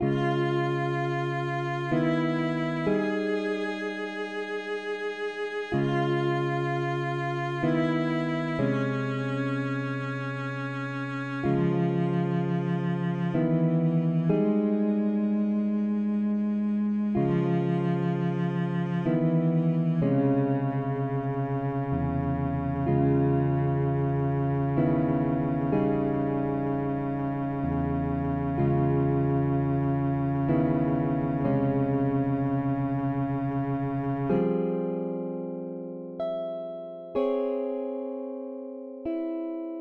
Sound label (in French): Cloches